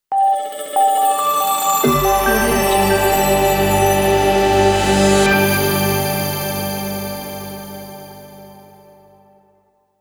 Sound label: bright technology logo